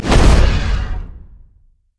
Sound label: dragons descent; LoL; shyvana